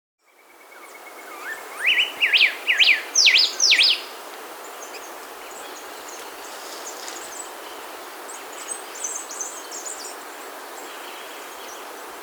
Blue-Winged Mountain-Tanager (Anisognathus Somptuosus), Andean Birds; S. America